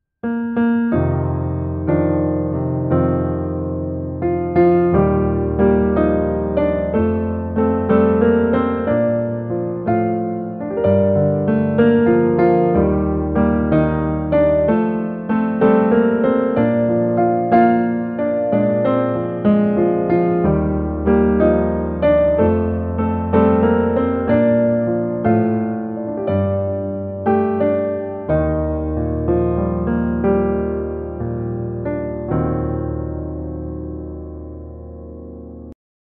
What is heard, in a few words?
Instrumental